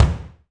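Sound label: bass drum, Roland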